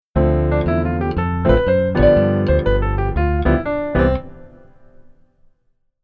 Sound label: Keyboard Solo Right Hand